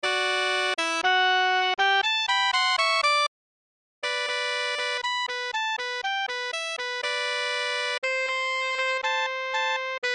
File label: Sax Duet